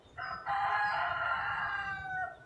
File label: rooster